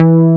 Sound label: STACK BASS, Bass Guitar; AKAI S6000 CD-ROM - Volume 1